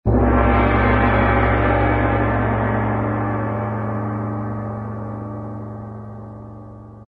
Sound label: gong arabian